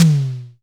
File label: Snare - Long; Tama TS-206